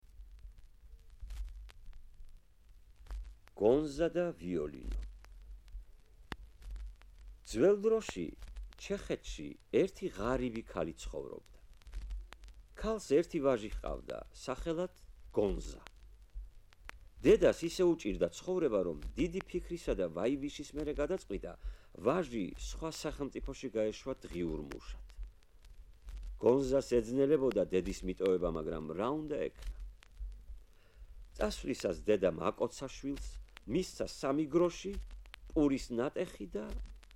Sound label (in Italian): violino